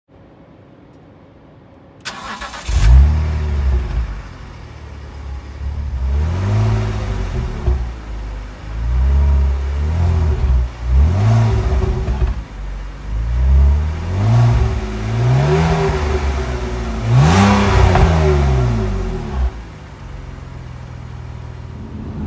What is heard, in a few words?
BMW Z4 E85 sound